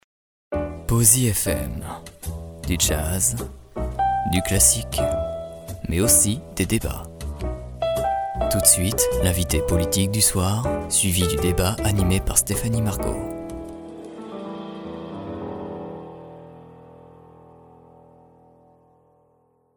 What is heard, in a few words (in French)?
Jingle radio rassurant et classe